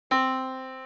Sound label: Yamaha Disk, piano sound